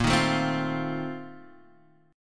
A sharp Major 7th Suspended 2nd Suspended 4th, down